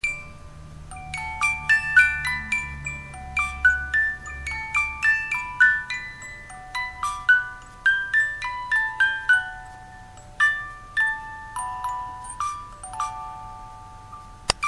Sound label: piano